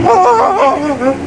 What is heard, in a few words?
Dog pack howl